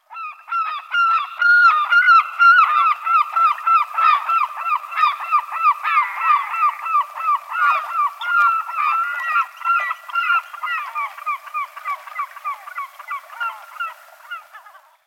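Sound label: serebristaya chayka voice, herring gull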